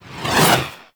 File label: Leap Whoosh; Warframe; Venus; Venus Terra Moa; Abilities